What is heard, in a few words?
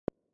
417 Hz; RE; Undoing Situations and Facilitating Change